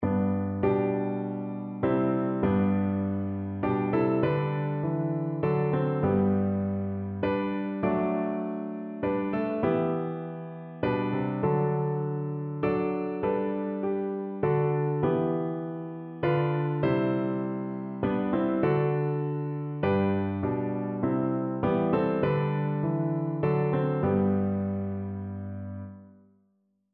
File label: savior bleed, piano